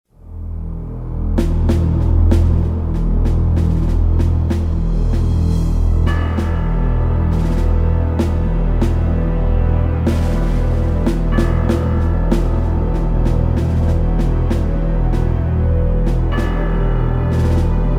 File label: slow, music; Soldier Of Fortune II Double Helix; XBOX; shop